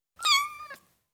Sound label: Ten Week Old Kitten, Individual M, Cats